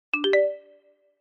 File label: Message, notifications